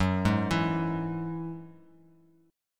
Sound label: F sharp 7th Suspended 2nd F sharp down; F#7sus2 arpeggiated